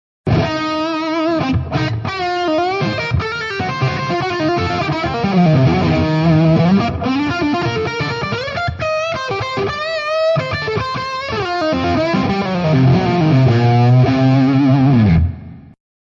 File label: Fat Lead